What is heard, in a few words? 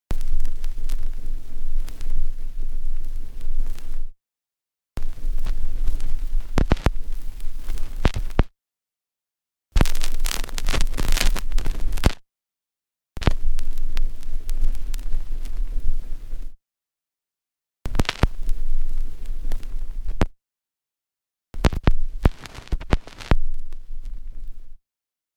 Vinyl Stylus On, Various vinyl needle set downs on a record; Miscellaneous Electronic